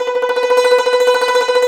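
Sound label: YANGCHIN tremolo; INIS - Opium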